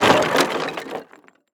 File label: wood sticks